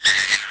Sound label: monkey dead; voices; Pirates Of The Caribbean